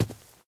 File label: grass, step; Minecraft; Votive World